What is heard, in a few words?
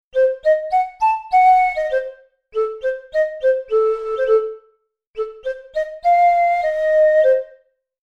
Ocarina